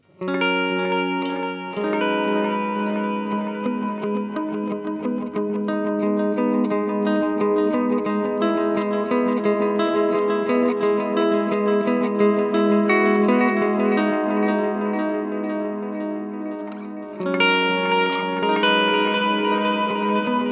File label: Boss SDE-3000D chorus modulation